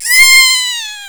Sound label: death; faerie